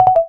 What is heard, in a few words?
Disconnect; GPSMAP H1; Proximity Alarms